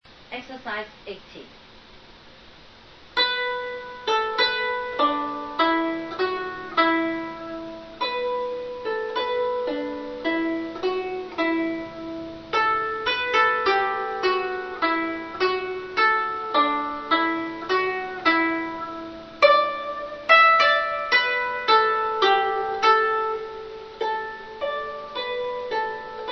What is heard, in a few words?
zheng